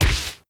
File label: Enemy Bigstamper Fall